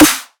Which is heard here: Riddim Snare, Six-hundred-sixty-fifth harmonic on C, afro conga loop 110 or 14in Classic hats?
Riddim Snare